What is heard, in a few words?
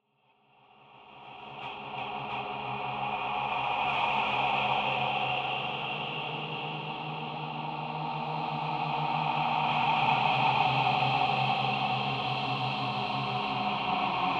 Drone Musical